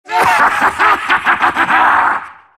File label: silt laugh; dota; siltbreaker